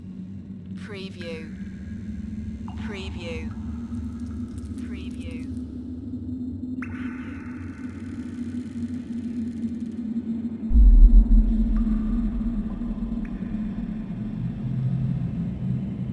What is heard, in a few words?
Horror Scifi Soundscape - The Dungeon Cave (Loop)